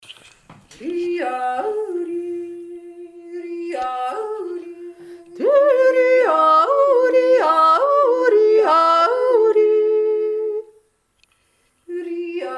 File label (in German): reiberer obere stimme